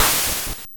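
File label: cymbal 8-bit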